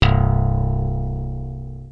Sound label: low slap, Basses